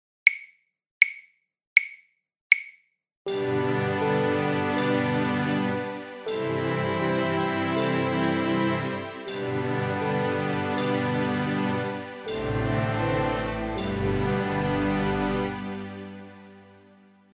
Suo Gan Backing Track

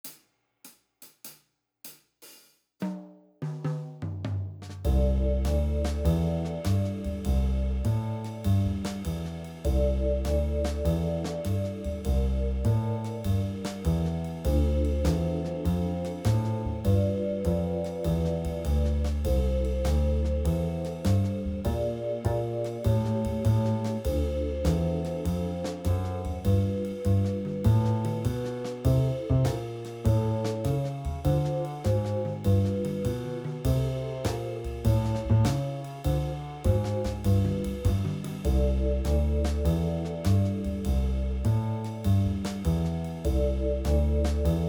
Walking Bass Backing Slow

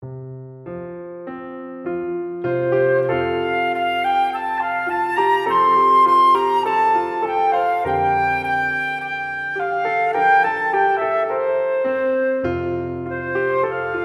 trad american shaker melody

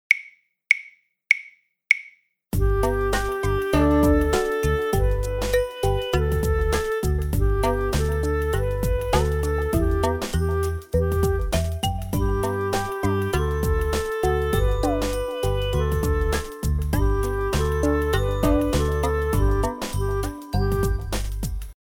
Full Performance

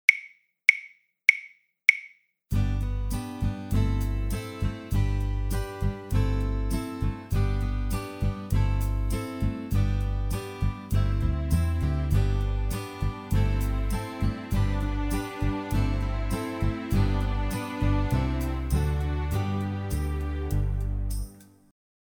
Au Clair De La Lune Backing Track

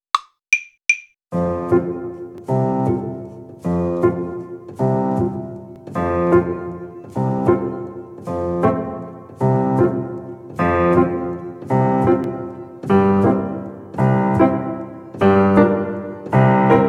Delibes Waltz Backing Track

